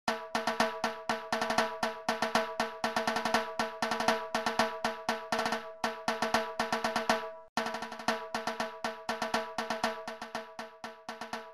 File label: Percussion; Grade 9 Solos